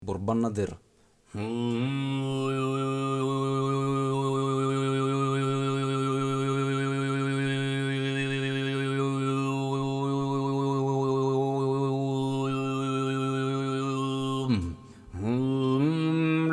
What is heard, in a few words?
borbangnadyr